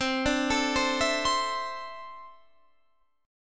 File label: E 7th Suspended 4th Sharp 5th